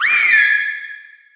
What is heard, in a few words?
skill whistle; SkillSound